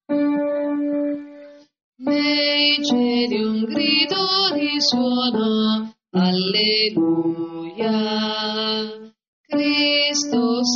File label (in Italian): nei cieli un grido bassi